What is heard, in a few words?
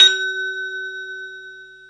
High Bell & Click